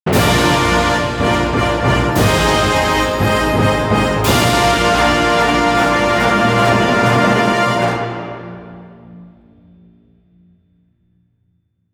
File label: Fanfare